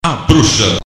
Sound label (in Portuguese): bruxa funk